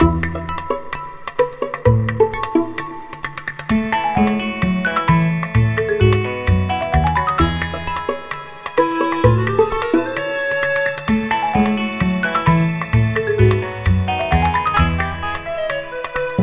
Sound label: Game Music